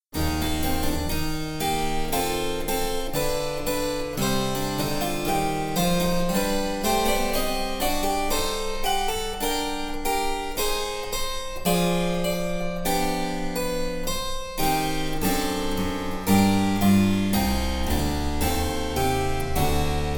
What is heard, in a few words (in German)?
Cembalo; Mark Lindley WTC 1